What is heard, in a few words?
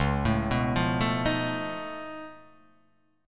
C sharp Augmented 9th, C sharp, down